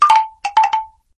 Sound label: chimes, notifications; Sony; Xperia Z3